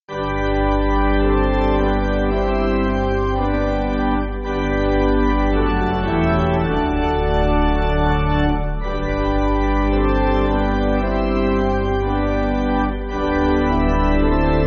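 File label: Pipe Organ, Music Backing; Down Lifes Dark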